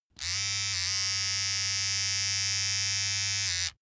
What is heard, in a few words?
razor small